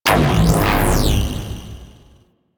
Ricochet Activate; Warframe; Cowgirl

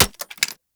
Shot Mech; Warframe; Weapons; Acceltra Prime

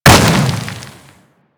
Pulse Exp Impact Default; Warframe

